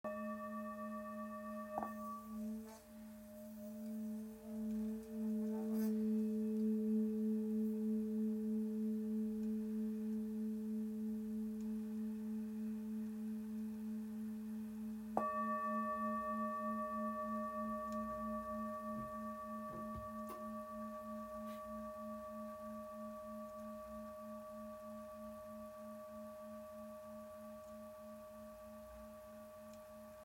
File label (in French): Bol therapeute la